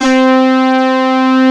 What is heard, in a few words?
AIR PAD